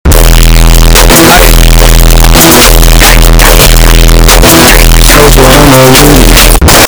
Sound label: hyper distorted